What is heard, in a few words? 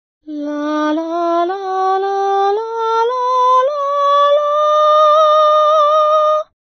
chest voice to your head voice